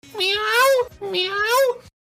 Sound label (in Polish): miau